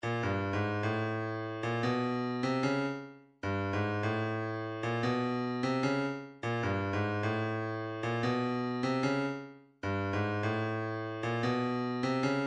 150 wavedrum bass

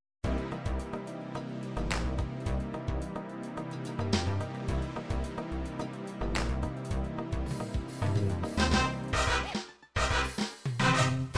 funk fusion, midi

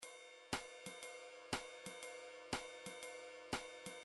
drums, midi drums

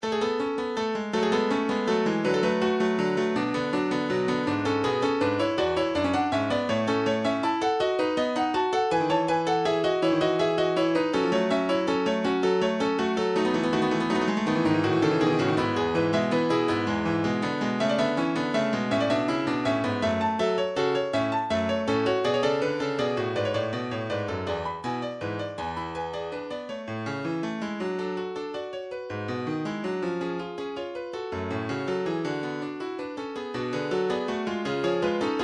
piano, midi; piano version of bachs two part inventions

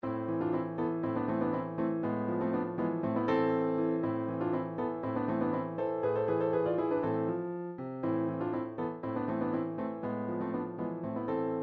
Hungarian melody, midi; jensen

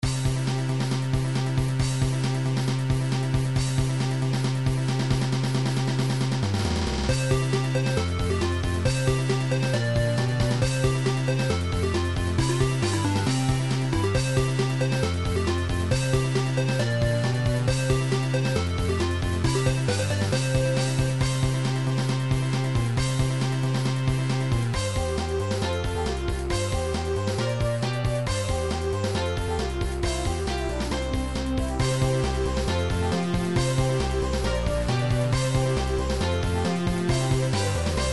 techno remix; nintendo 64; sequenced; banjo tooie; jinjo village

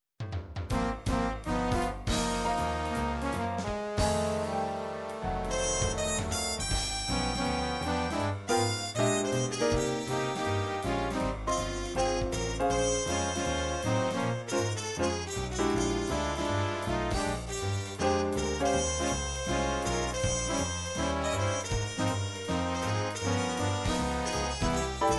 jazz, midi

